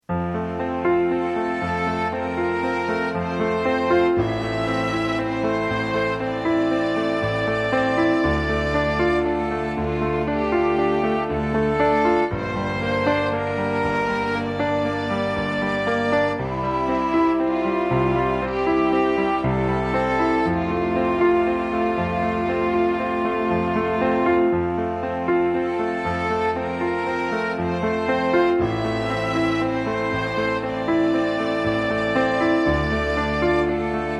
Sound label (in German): supersweet quartett